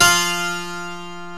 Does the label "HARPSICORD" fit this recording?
Yes